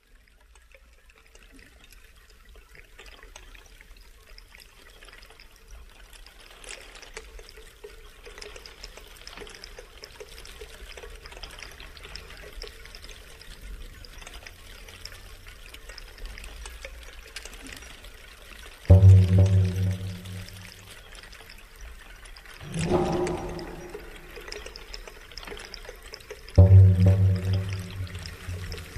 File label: Waterfall